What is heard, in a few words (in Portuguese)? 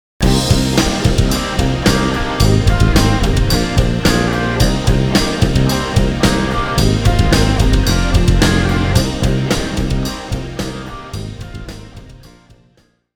Riff 1 com falta de agudos